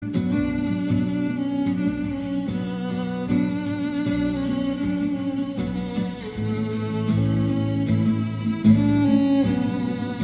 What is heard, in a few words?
violin